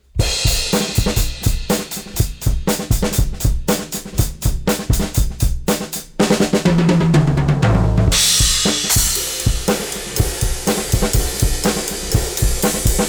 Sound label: drums